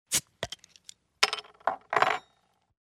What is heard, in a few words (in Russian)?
otkryvanie kryshka i otkryvashka